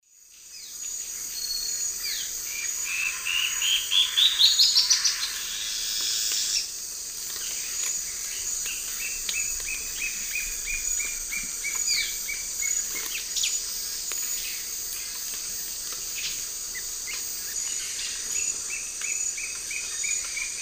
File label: Thamnomanes ardesiacus, song; S. America; Eastern Lowlands